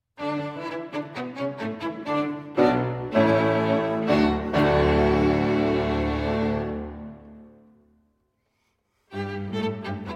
Piano Quintet in G Minor, Finale - Allegro Molto

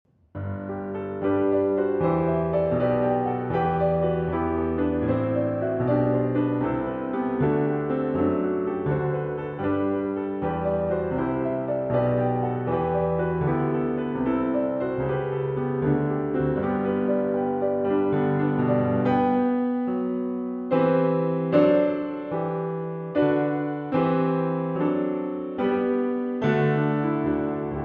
Chorale, Solo Piano; Cantata No. 147; Jesu Joy

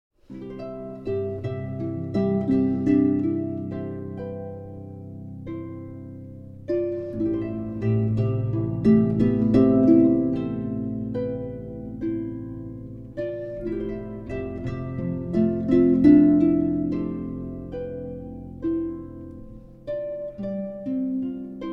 Arr. For Harp

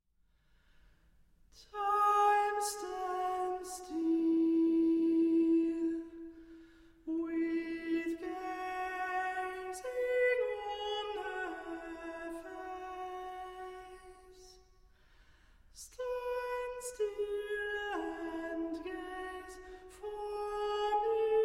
Violin and Vocal Ensemble

